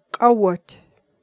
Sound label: DRUM